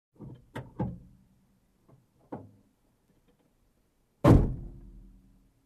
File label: Car opening and closing trunk